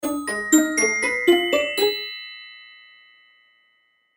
Accent Pizzicato Strings Glockenspiel Melody Whimsical; Musical; Blastwave